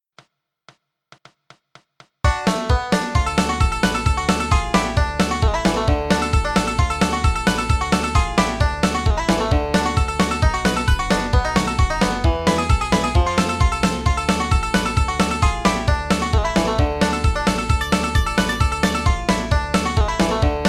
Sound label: bluegrass banjo fiddle